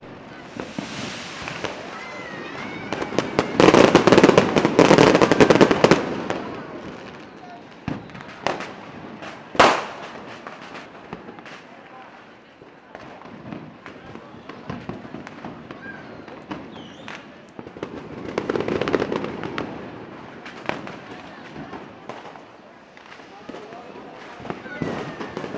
fireworks mixture urban; sound effects one

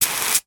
household polish furniture spray short; sound effects one

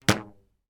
rubber band twang; foley

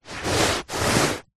hand movement scrape carpet; zapsplat; foley

